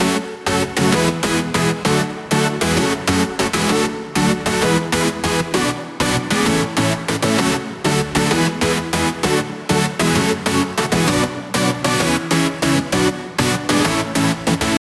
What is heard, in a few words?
Supersaw, dance chord progression, top triplet melody, F# minor, medium reverb, 8 bars; Audialab EDM Elements; 130BPM